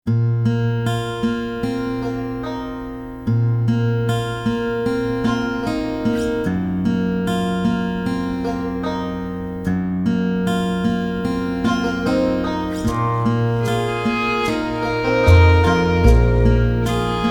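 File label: with banjo; BT Washington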